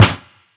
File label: Verby Snare Sample F# Key, snare drum tuned to the F# note